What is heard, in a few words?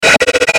static burst; Static Burst Chopper; Production Element Imaging Element Accent Transition; Blastwave